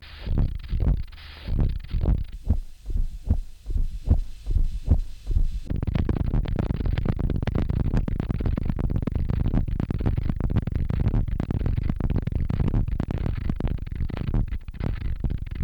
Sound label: beat